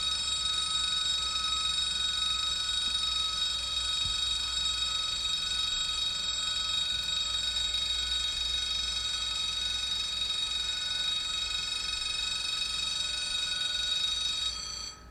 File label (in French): sonnerie pn pour arduino